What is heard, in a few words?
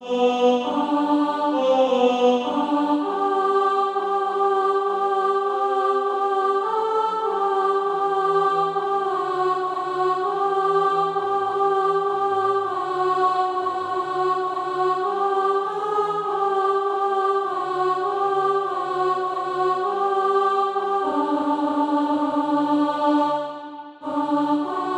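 alto, Practice Music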